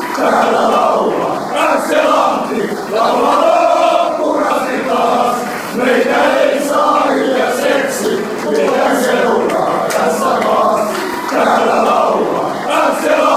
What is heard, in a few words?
singing, fanchants; this is lahti